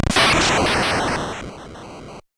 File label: finishing blow, attack sound; カタナ１